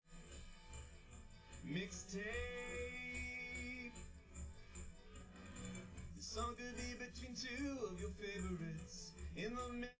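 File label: with drums, live rec; SiSEC11; underdetermined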